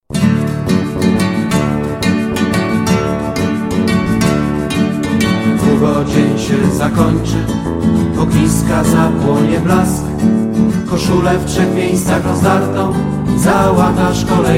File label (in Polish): samba